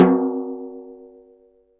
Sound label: Snare Drum; Percussion Samples